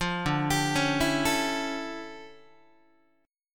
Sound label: D Minor Major 11th, down, Guitar; 8,10,10,11,8,8; DmM11 arpeggiated; JGuitar